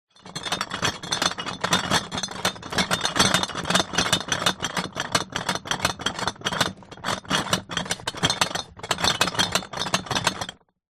foley bottles glass empty in cardboard box movement carry